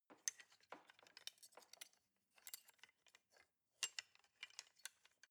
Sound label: knives wood handle long